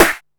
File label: snare, techno 138 BPM